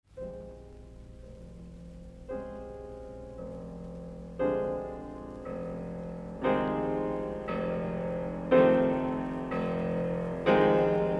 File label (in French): CONCERTO POUR PIANO ET ORCHESTRE EN UT MINEUR Allegro moderato; RACHMANINOV; OP. 18